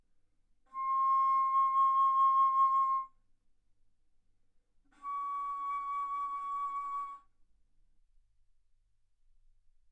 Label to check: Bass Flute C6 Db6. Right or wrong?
Right